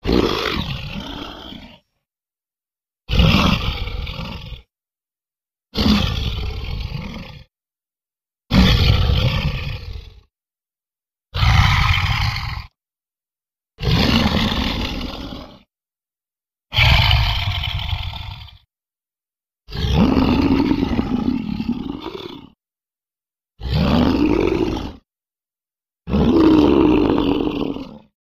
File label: Monster Breath roar